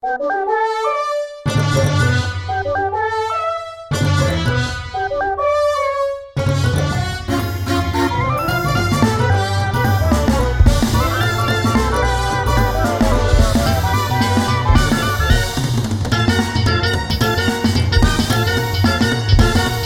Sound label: Big Band Jazz Battle; YIIK A Post Modern RPG